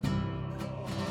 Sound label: Music Main Amorce 108bpm; Act Corsica